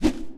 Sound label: foley whoosh; player